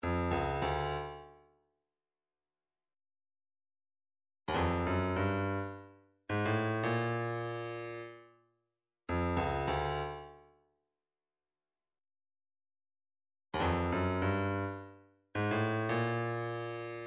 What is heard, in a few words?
separated elements cowbell